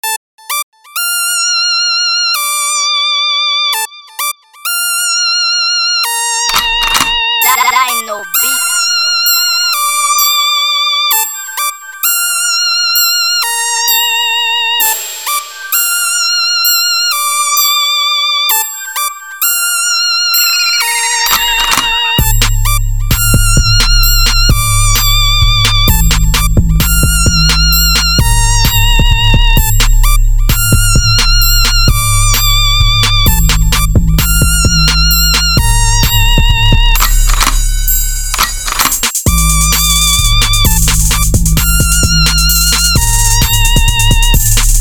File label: Type Beat